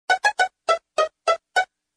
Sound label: Whistle